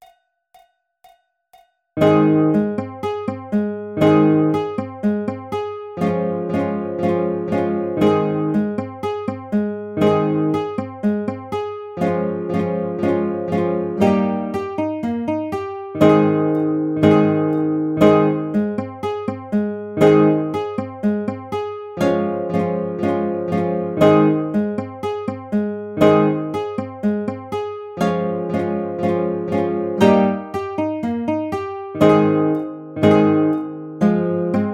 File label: Ab Major Arpeggiator Baritone; Jumping Flea Arpeggiator